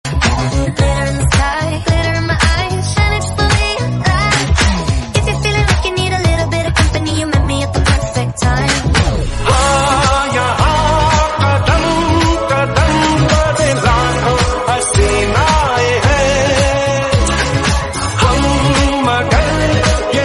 remix mashup song

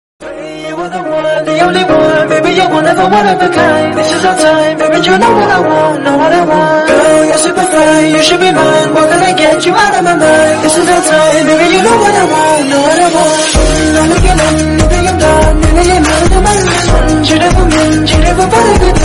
kannada song, kannada love song

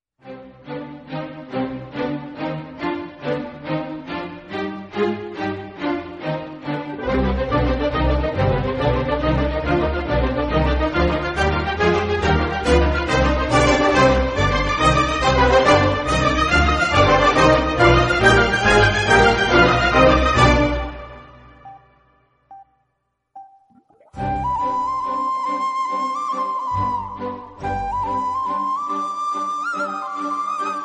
instumental flute; sairat

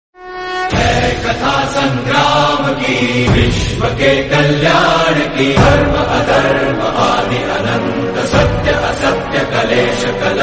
yudh music bgm; mahabharat

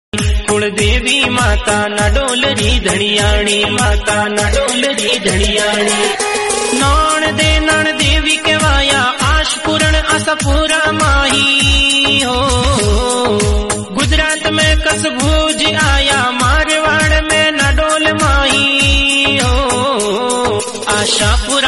hindi bhakti song

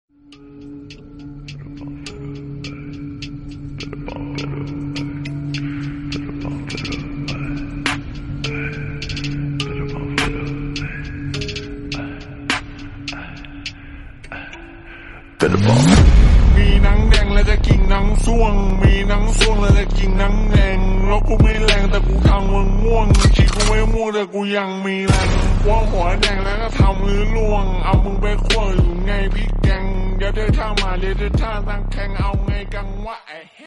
remix bgm

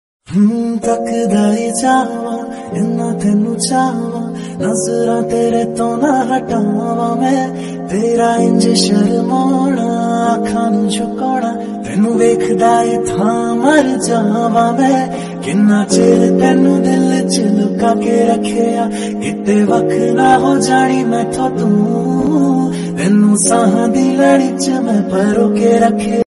punjabi

